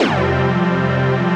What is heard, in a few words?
PAD